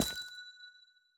紫水晶踩踏声

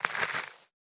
堆肥桶堆肥完成声

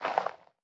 堆肥桶填充声

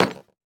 下界砖踩踏声

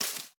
杜鹃树叶破坏声

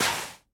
灵魂沙踩踏声

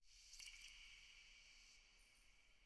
眼眸花长时间开放声

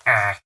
村民否定声

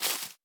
樱花 树叶 踩踏声

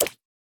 青蛙长跳声

